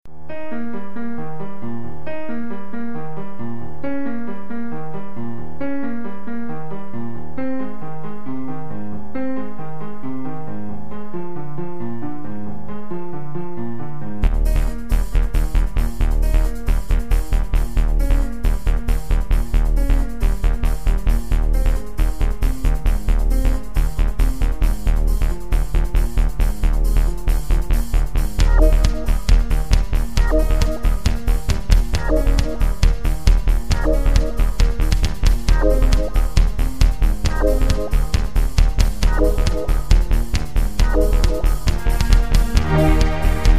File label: bach techno midi remix